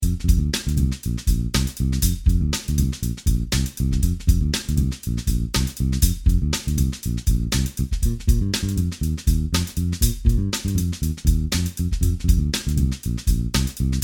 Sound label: bass guitar